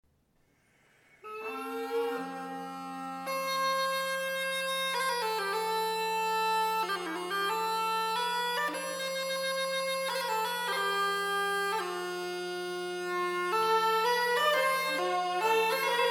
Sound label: bagpipe Menuet; Leif